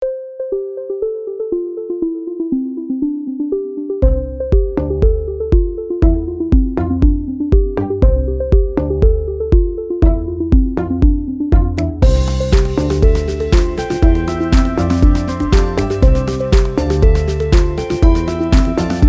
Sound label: SNAP N CLAP